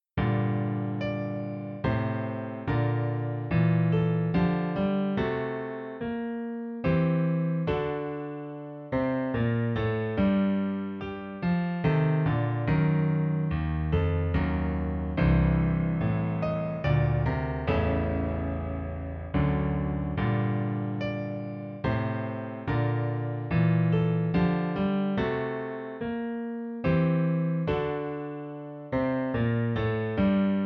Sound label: Choral